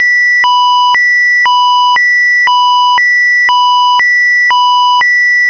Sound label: tone